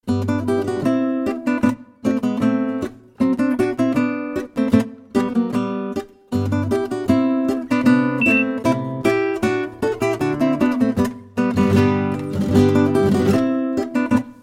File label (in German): Boarischer